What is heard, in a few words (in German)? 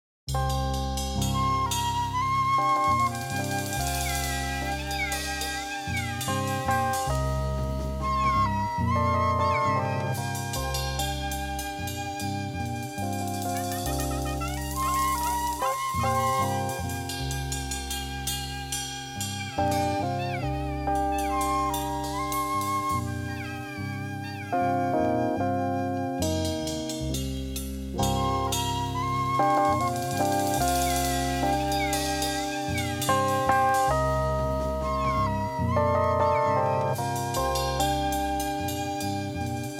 Stimmungen Orientalisch